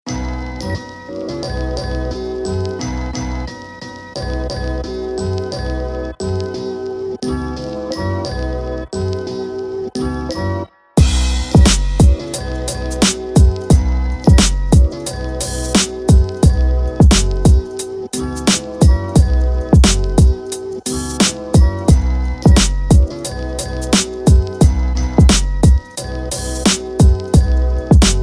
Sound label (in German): Glocken